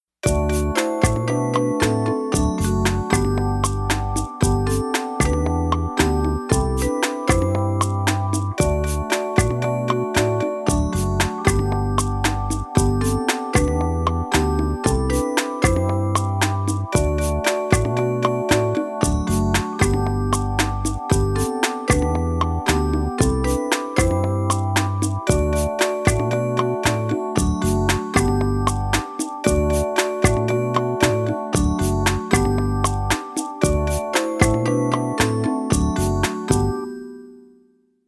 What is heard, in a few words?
Instrumental